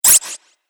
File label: Robot Ultra Fast Swipe, sci-fi robot sound effect